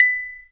agogo, Yamaha TG-500

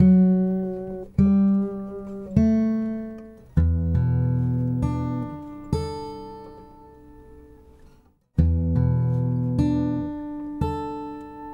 Blue Trees Gtr, Guitar Only; Sonic Foundry (Sony Creative Software); Friendly Fire

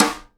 SIDE STICK, Live Snares

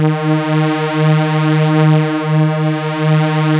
OB D#4 -R, Rare Synthesizer; Hollywood Edge - Giorgio Moroder Rare Synthesizer Collection; OB 4 VOX.

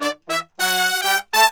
100, BRASS SAMBA

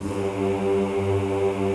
G1-L, Classical Choir; Best Service; Extended Classical Choir

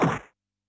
PUNCH, Yamaha DD-65

